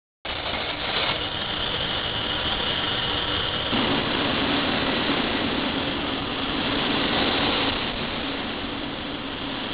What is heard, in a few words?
engine